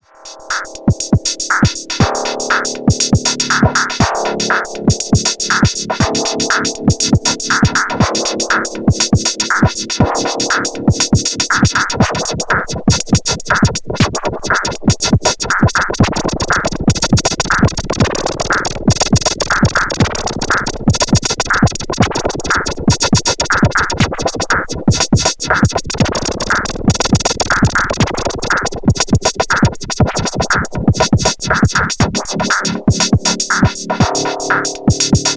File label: Erica Synths Perkons HD 01 Modulation